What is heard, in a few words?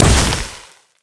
Hit Generic; Pele; pantheon; medusa and pele abilities